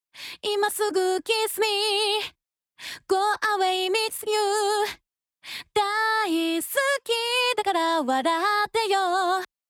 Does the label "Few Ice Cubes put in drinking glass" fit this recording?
No